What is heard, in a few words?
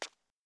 footstep plastic; fallguys